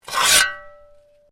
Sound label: Shovel Scrape; Working with a shovel or spade; Scrapes; Objects